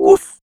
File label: WOOF, TUBEVOX DRY; Q Up Arts; Denny Jaeger Private Collection